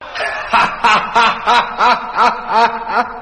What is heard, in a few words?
haha; musuka